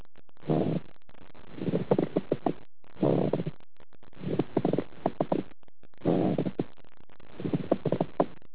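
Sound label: pleural, pulmonary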